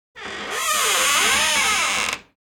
Creak Wood; Inquisitor 3

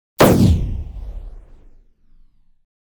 Gun Shot Large Heavy - Shot; Hollywood Edge; sound designer's toolkit v2

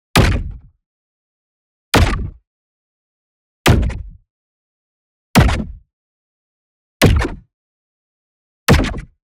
EXPLOSION SMALL Short Resonant Transient; boom library; Matter

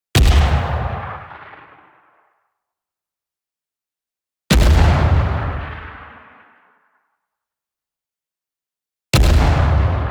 EXPLOSION SHORT Bright Burst; boom library; Anime Essentials

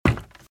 Footsteps - Wood - Creaky - Run - Right

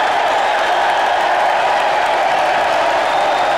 BBall Crowd Cheer Loop; Crowds

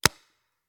Paintball Gun; Sound Ideas; Guns

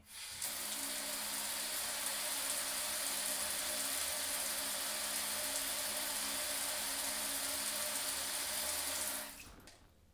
bathtub; liquid; NS Studios - ultra sound collection

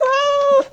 cat Meow; DeadFright

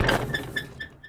Street Ball Glass Basket; SFX Kit

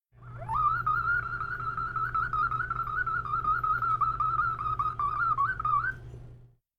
Strange whistles; KMP Film Video Sound Effects

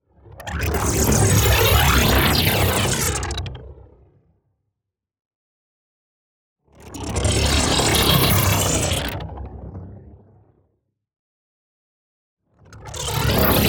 NEON WHOOSH LARGE SLOW Granulation Modulation; boom library

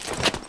Rustle Body Movement Crouch; SFX Kit; Foley - Rustle

